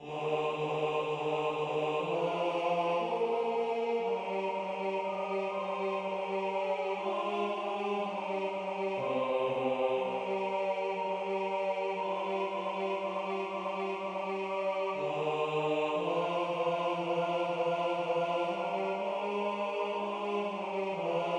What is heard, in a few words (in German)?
Bass; Pour un lac